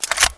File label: bar clip out